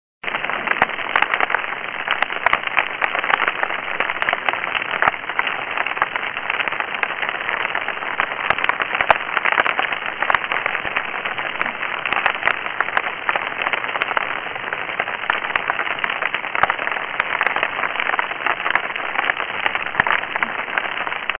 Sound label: Snapping Shrimp Dawn